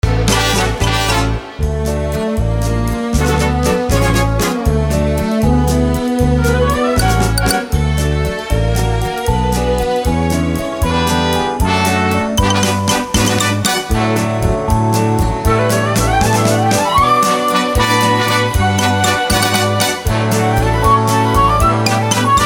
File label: Instrumental; audition materials; Willy Wonka Jr